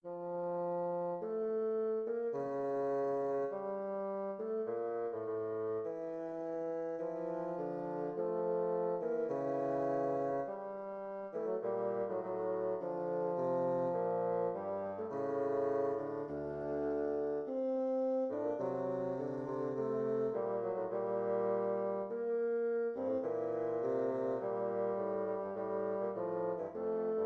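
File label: bassoon duet; lentement; philidor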